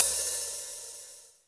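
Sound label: hi-hat, drums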